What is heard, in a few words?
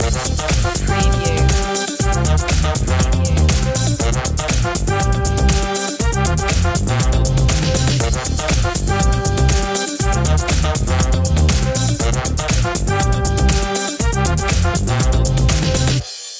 funky yeah loop end; media music now